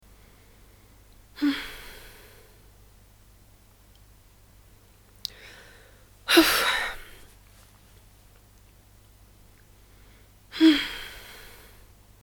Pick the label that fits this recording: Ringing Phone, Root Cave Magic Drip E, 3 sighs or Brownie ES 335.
3 sighs